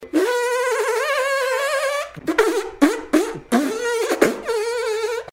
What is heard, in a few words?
alert